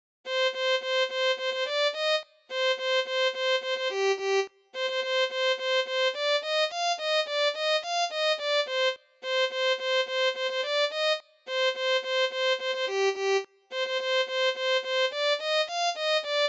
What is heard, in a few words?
Violin